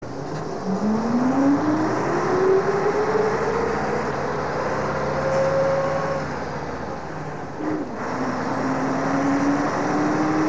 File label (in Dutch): Leyland motorgeluid; Busbrief 130